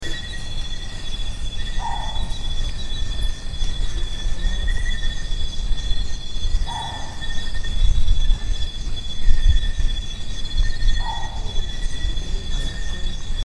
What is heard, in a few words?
Owl Kitanglad